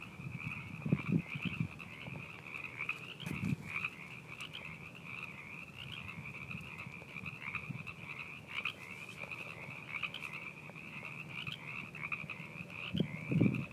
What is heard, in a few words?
Frogs; Valentines Day